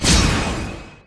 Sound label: Trap Iron Exp